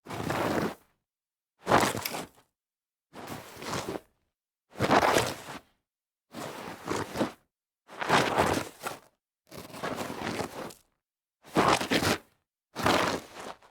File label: sliding on dirt